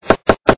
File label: login